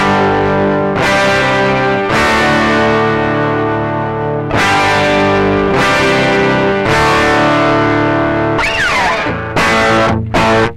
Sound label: Overdriven Chords